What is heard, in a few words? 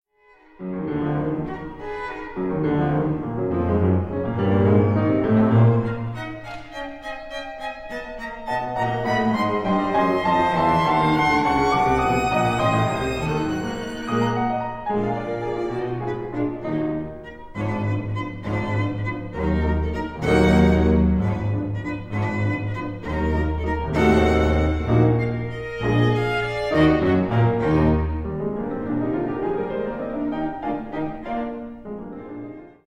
String Chamber Quintet; Hummel